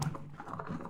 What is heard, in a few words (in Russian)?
voda udar blizkij shumnij